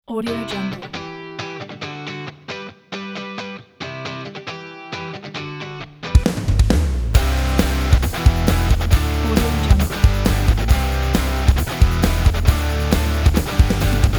rocking kit

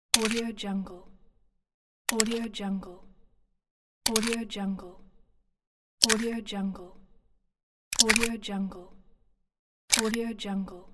gun pistol remove magazine; prowaveaudio

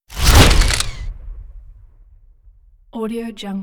camera surreal blasting whoosh by shutter; prosoundfx

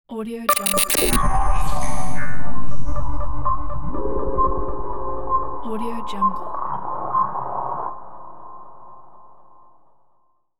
space fighter ship

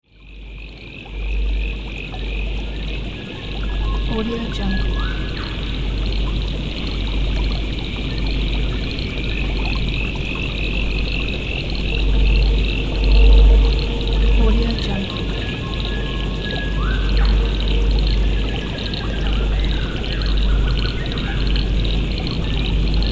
fairy forest lotus flowering

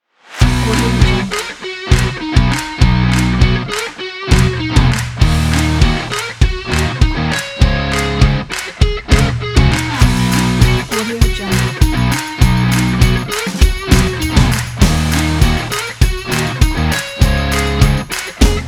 upbeat and energetic extreme sports

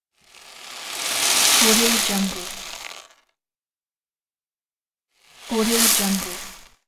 sand slide; electronplanet

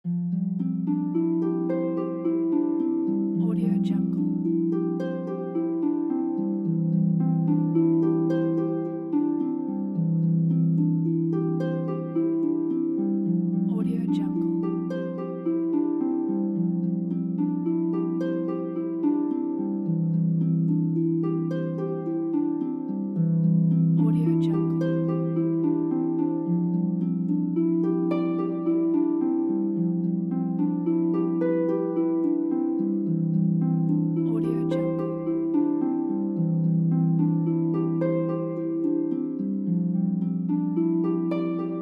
relaxing harp and piano background music

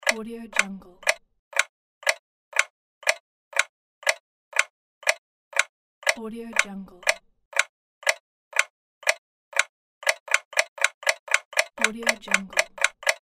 clock

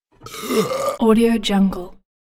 male burp

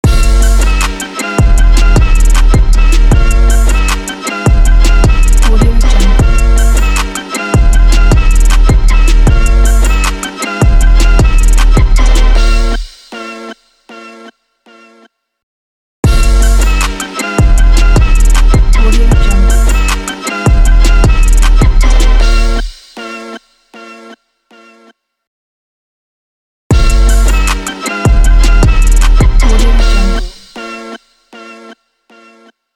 trap hiphop logo

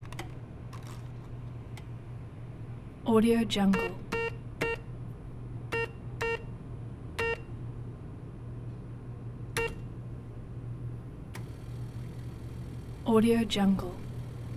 automatic teller machine; prosoundfx

